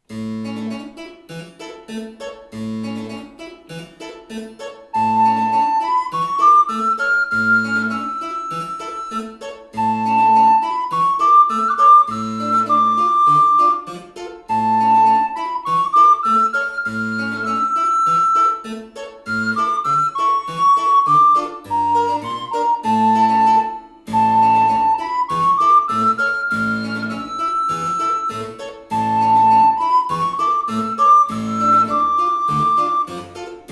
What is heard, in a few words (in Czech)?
flétna + cembalo; Ailalelo; Flautoškola 2 – METODICKÉ NAHRÁVKY a DOPROVODY